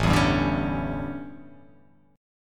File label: A 11th A down, chord; strummed